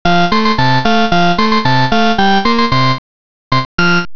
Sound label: End of Game; video game sound effects; Mr. Do!